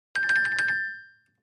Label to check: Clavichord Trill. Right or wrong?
Right